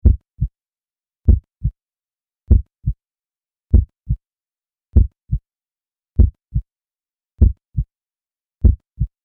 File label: human heart beat; Heartbeats; McKinney Sound